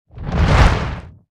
Rock Rumble

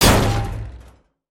Generic Complete

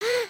need to pee again; emotes

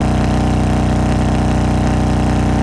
diff MOTO higher, vehicles; aronwk collection; ndaudio

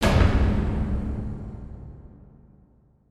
piston arrive; spaceship

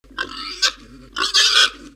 Pet Warthog Idle; creatures pets

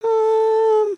curious, emotes

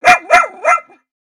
Pet Dog Robotic Happy; creatures; pets; Lego

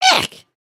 thumbs down; emotes

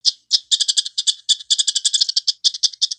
Pets Skunk Ecstatic; creatures; Lego

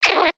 Rat Walk Squeel; creatures organic

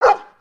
dog bark

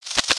Spiderling Stunned Struggle; creatures mechanical

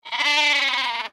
Sheep Baah; creatures organic

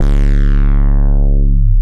Hull Lights, ambience spaceship

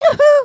wave, emotes

